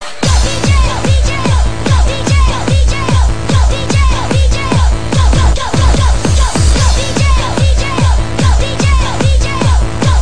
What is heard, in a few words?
Techno